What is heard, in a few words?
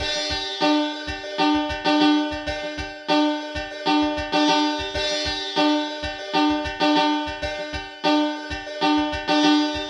drum beat